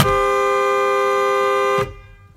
mustang gt horn

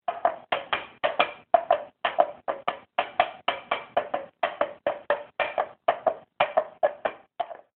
galloping

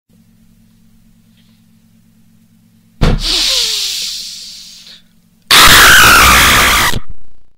stubs toe scream

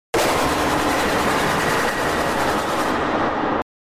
jumpscare; interminable rooms

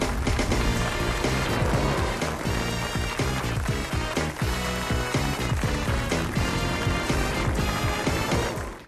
theme swedish version

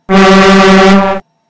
honk sound; breakdance; huss breakdance

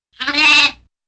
lamb bleats

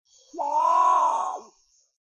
potoo maawwwm

